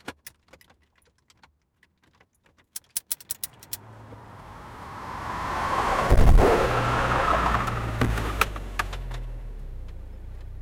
the sound of the car

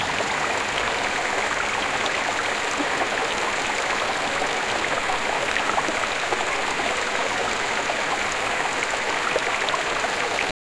a small stream babbling over rocks in a forest

